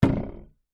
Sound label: food apple down in empty china fruit bowl